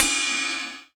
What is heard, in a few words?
RIDE; Best Service; Dance Mega Drums CD2